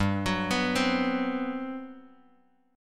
G Major 11th, Bass Standard; arpeggiated; JGuitar

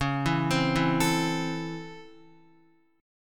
C sharp Minor Major 7th Sharp 5th C sharp Guitar; C#mM7#5 arpeggiated

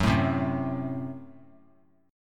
F sharp Diminished; F#dim strummed

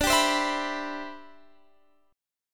C Major 7th, D, guitar chord; Cmaj7/D strummed; JGuitar

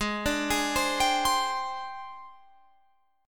G sharp Major 7th Flat 5th

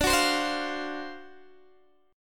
D 7th Sharp 9th, Guitar, DADGAD

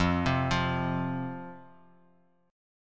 F 5th Guitar Half Step Down, chord

